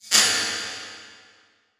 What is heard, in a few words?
Transition Hit Impact Short